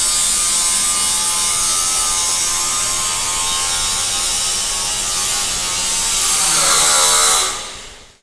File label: Handheld Grinder